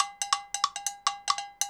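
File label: timbale cows, latin, 140bpm; Sound Effect Library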